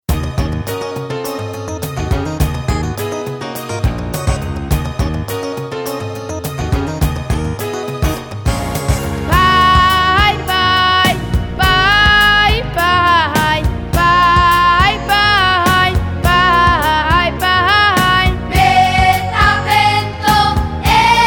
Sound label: niggun